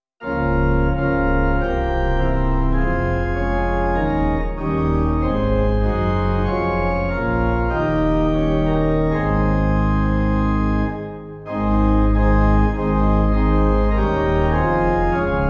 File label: Pipe